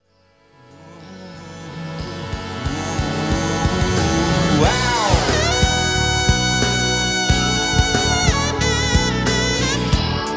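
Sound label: Tenor Sample, saxophone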